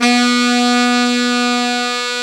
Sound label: A SAX